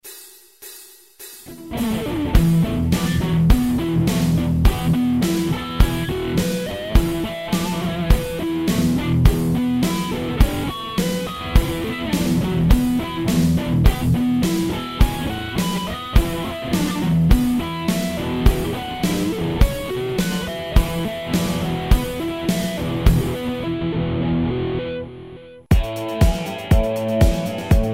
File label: arpeggio sequences